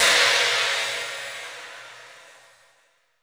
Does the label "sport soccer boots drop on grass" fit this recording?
No